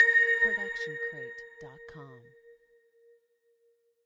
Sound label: tonal blip; soundscrate